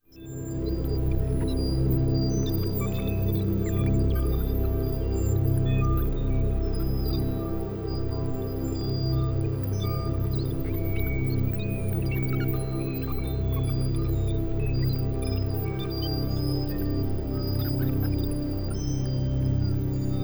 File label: ambience; prelevel 4